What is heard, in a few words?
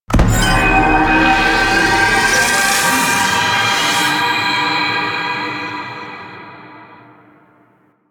Activate Tower Power Stream; Warframe; The New War